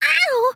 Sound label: grunt hit; Ying; Paladins